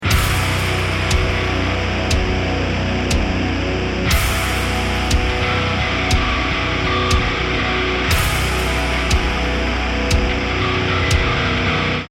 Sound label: round ten sec count